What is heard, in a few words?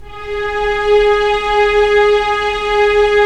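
STR String Section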